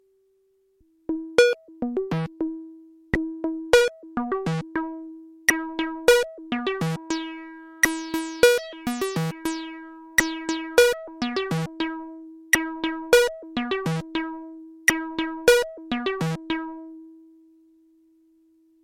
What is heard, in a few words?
stepper acid